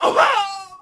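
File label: Swordsman Die Vox; Civ4 warlords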